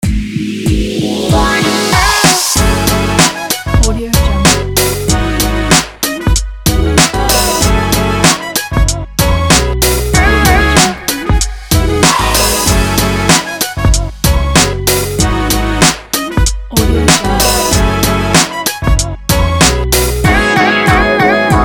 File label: hip hop opener; fassounds